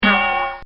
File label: Cartoon Sound Effects Bong; Cartoon Sound Design